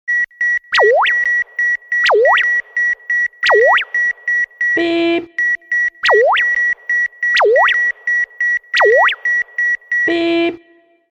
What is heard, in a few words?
Beep, ringtones; ghia productions; fun fx